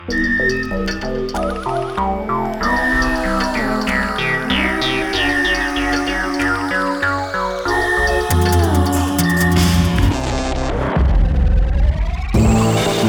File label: Feedback